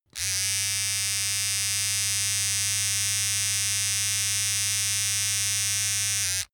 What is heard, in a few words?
razor small long